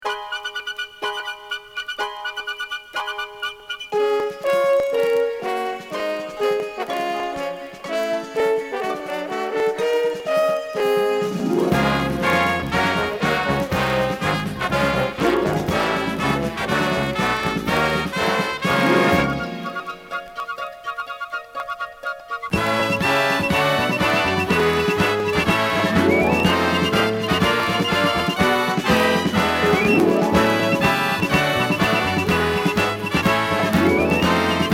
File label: ATC Theme Newsy Brass